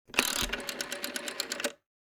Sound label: rotary phone